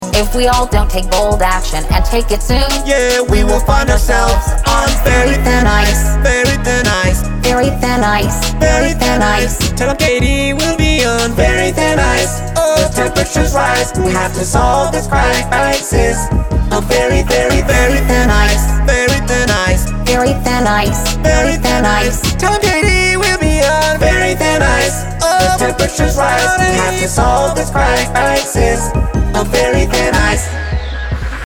ringtone android

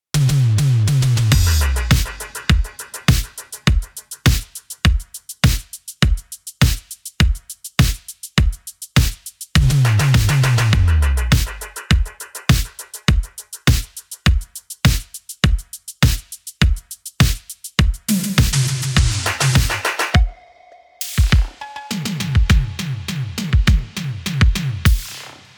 DRUMS

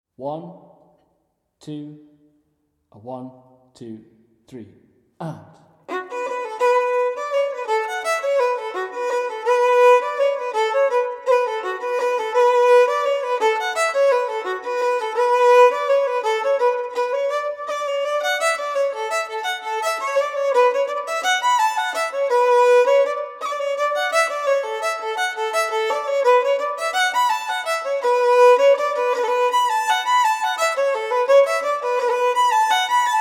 The Musical Priest Fiddle Steady

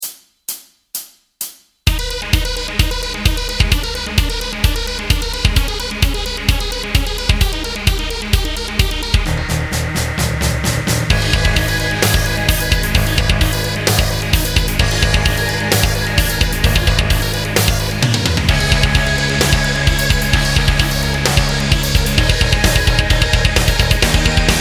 Tapping Torture Backing Track

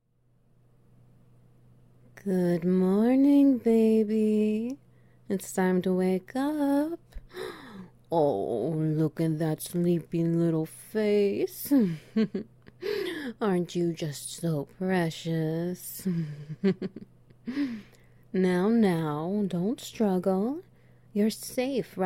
Wet Noises

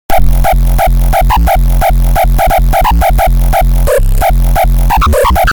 piep

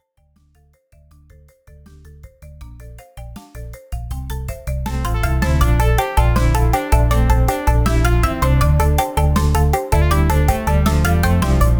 Jungle Level Music Track

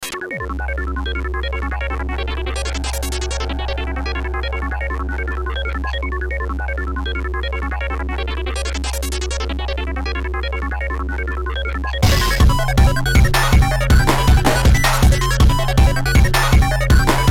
extreme sound techniques for ym2612

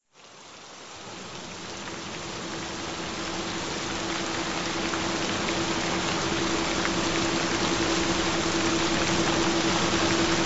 Gentle Rain Sou

